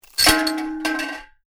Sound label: Metal Junk With Chain Clanks Rattle; Metal pipe with chain attached rattles and drops; Metal Sound Effects